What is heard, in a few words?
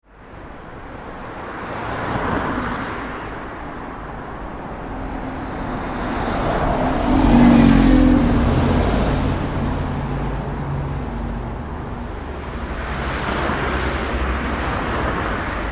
ambience urban traffic main road med dry bus, Traffic Urban Main Road (30Mph); Traffic Ambience On Urban Main Road - Medium Quiet At Aprox 30 Mph With Bus Approach , Sto; Traffic and Cars; Backgrounds; McKinney Sound